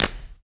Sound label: Body Perc; Spectrasonics; Supreme Beats; World Dance